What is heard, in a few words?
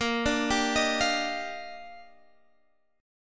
Eb Major 9th Bb